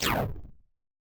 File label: Laser; glitch in the system; godot 4